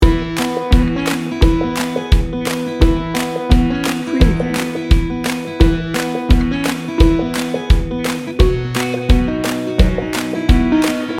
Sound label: folk electric loop